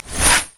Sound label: bola strike; LoL; hunter rengar